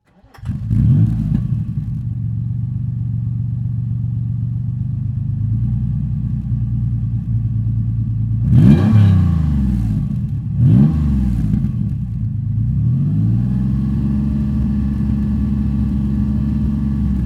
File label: TVR Tuscan S 2005